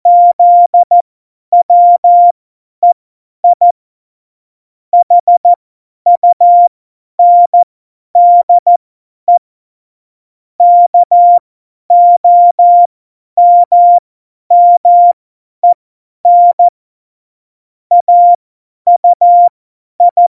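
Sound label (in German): Witz, Morsekurs, cw morsen; Tempo 50 F70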